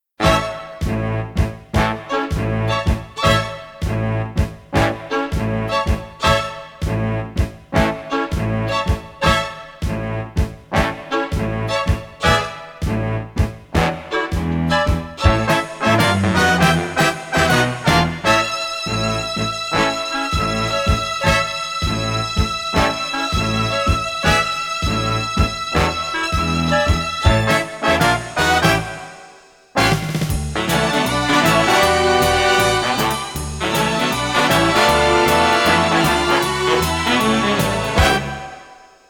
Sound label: Key of A Instrumental